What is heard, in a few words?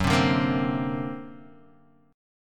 D Augmented 7th Gb, guitar